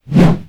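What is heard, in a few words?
gavel, attack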